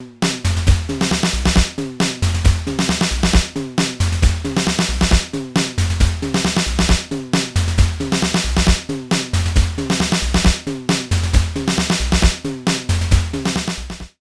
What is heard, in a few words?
Simmons SDS 1000 Abstract Fill